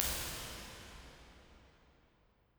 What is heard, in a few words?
impulse response; spessasynth